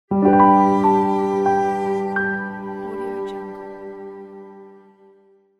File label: minimal logo